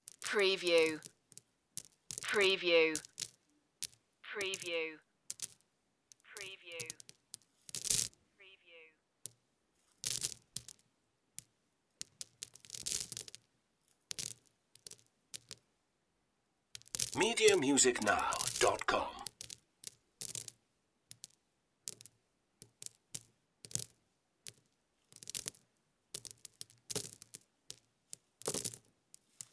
crackle and rustle

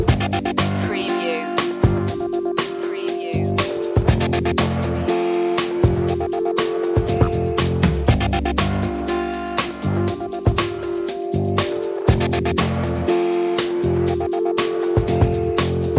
easy funky loop

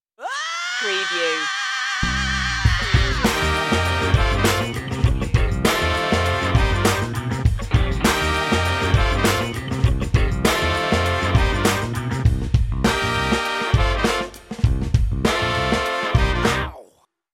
funkin yell

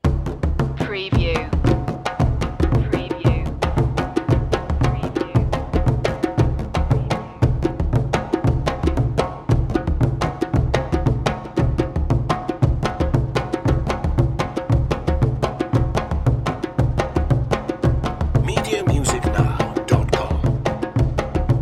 african drums ndengela zalamo dance

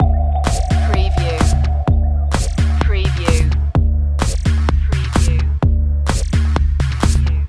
dub tek 808 loop

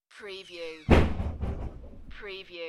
Door slam with squeak; beanstalk audio

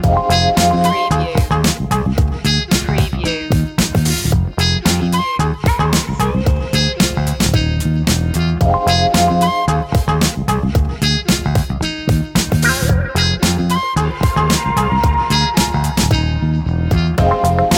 jazzomatic loop long

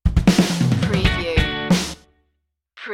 slam the door stinger

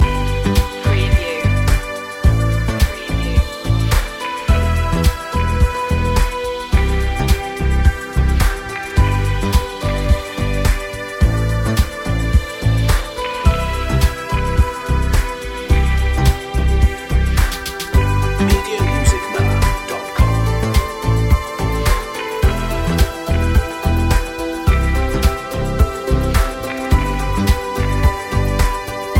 loop

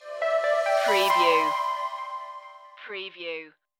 tones of aqua stinger

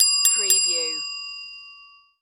Desk Bell Ring Three

